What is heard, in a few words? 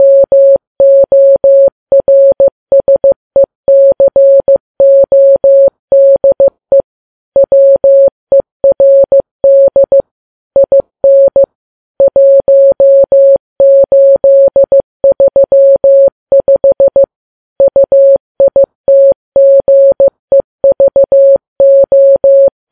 morse; 15kps